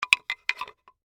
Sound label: Tonfa Handle Move, Warfare, Tonfa, Handle, Movement; Sword; Swords; Sound Effects:Combat; BlastWave